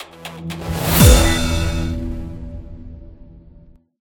Transition

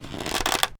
Longbow Pull Back; Timelock VR

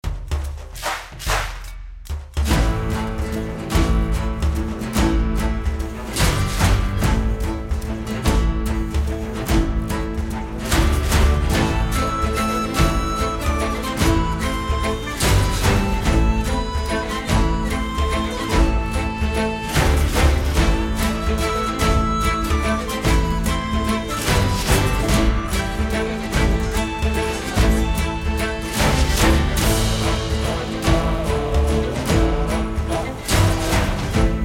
Music Main Menue; runic rampage

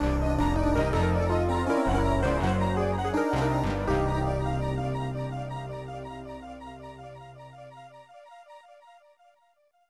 Logo; Night Warriors Darkstalkers Revenge; cp system ii; arcade

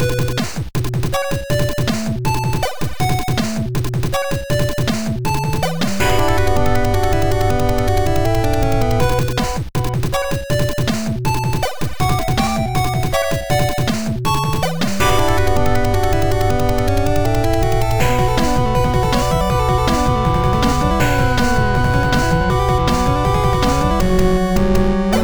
8bit; Jimmy and the Pulsating Mass; Righteous Scrabble

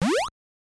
Mario - Luigi Jump; Mario Bros.; NES

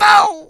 take damage large; Scrat

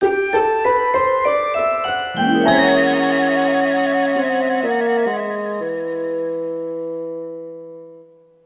Bari Sax

